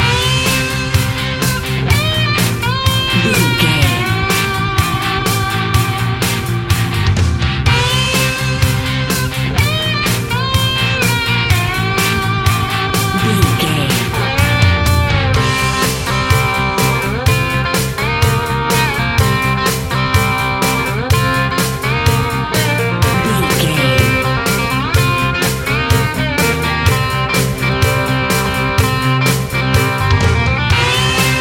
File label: rock steady highway loop